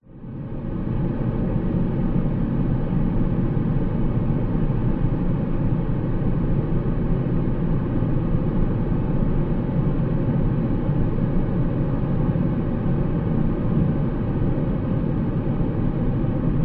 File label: science fiction spacecraft ambience interior large mid flight away from rockets